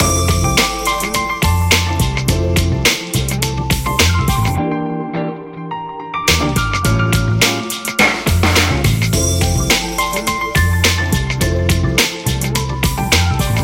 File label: HTC Windows 8 Tone